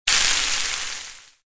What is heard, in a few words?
Environment Fire death hiss; SFX; The Binding of Isaac